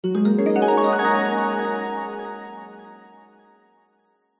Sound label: fantasy magic glissando warm harp mallet bright ascending; zapsplat